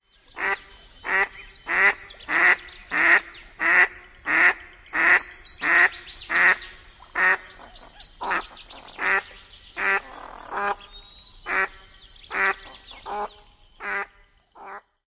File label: female persistent quacks